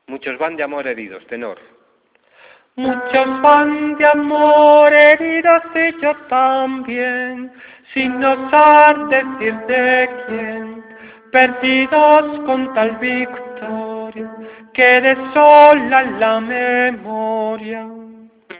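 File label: tenor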